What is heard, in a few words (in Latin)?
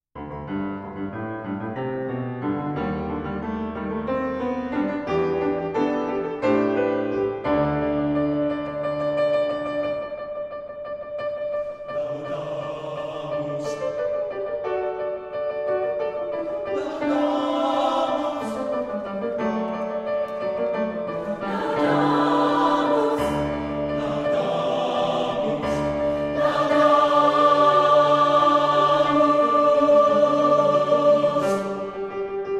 Gloria Laudamus Te; Gloria 3